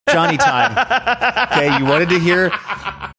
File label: having good laugh